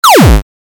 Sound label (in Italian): suoneria botton; beep